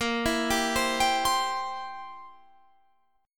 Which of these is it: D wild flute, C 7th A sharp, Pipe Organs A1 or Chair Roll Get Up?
C 7th A sharp